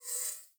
ferro switch off

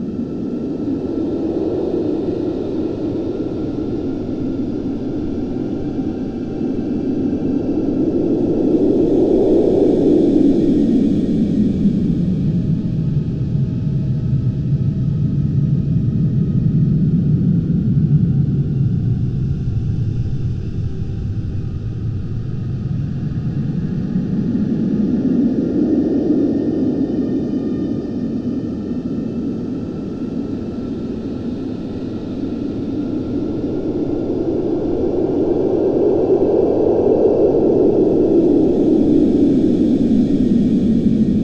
ambience planet; The Swapper

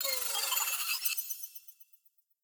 teleport

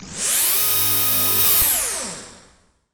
crab scream; boss

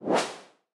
sweep long; CrossCode; battle

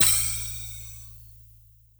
elemental pole finish

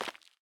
land concrete; The Swapper

